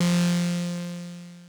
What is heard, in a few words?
synth poly c2